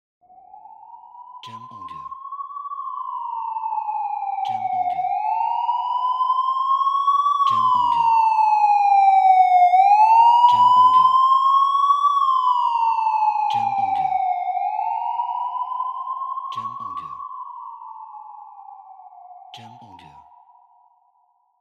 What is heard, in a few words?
Police Sirena